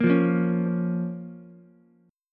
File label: A sharp Minor, Ukulele; strummed; JGuitar